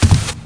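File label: footstep carpet; thief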